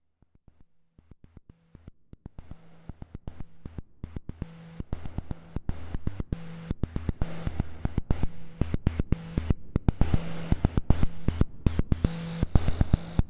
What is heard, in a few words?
ring mod